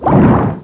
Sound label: stroke; PC Gamer IT CD 38; thief